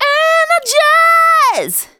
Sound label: vox, Techno Trance; Ueberschall